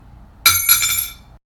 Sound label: Coin drop